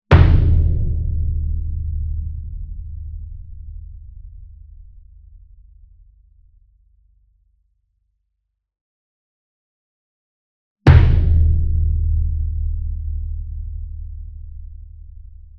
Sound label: Bass Drum Concert 40 Inch Hit Soft Beater Near; SINGLE DRUM; boom library; Cinematic Strikes Construction Kit